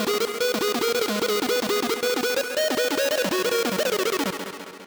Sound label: sub lead bg